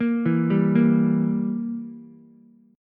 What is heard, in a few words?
E Diminished E, Ukulele; Edim arpeggiated; JGuitar